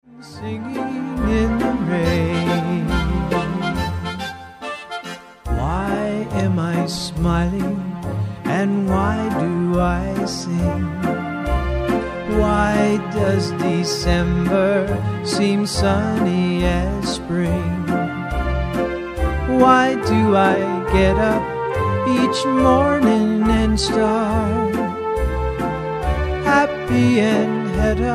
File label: singing